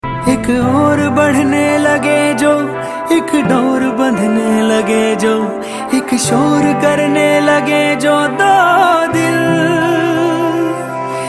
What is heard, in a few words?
Romantic